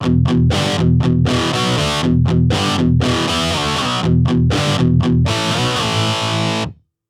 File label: Guitar RE-20